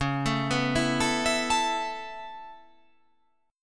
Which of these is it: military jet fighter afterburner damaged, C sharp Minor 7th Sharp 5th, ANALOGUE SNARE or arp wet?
C sharp Minor 7th Sharp 5th